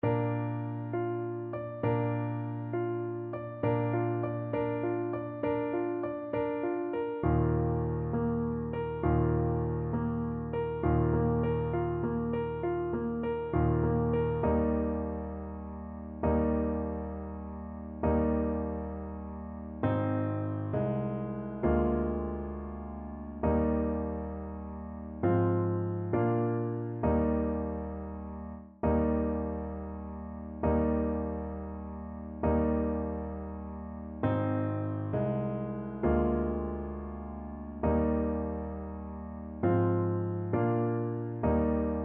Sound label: shepherds song, Trumpet; Beethoven, Ludwig van - Shepherd's Song (from Symphony No.6)